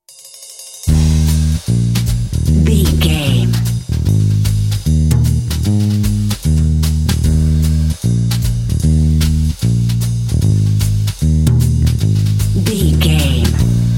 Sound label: Jazz-rock track; Jazz-rock track with 5 different versions, great for adventure games; Dreamer